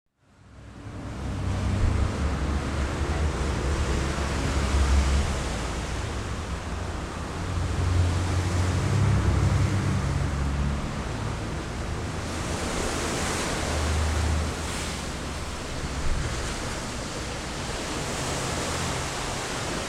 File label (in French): silence